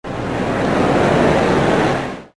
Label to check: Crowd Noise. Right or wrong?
Right